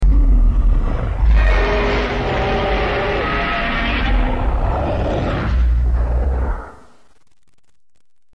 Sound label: Roars; Zilla